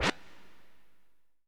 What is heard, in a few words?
hit